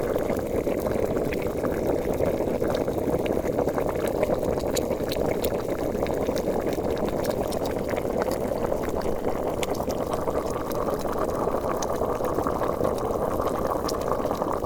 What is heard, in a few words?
cook